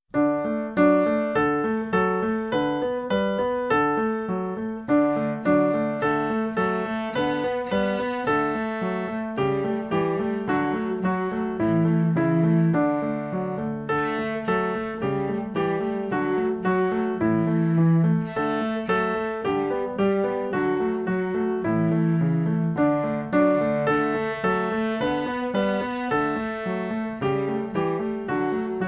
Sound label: Twinkle Twinkle Band